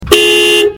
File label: Inspira Horn Outside